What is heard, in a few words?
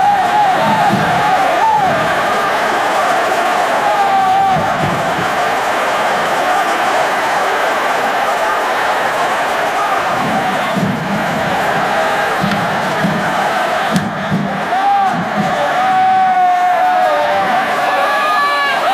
the noise of the troops; history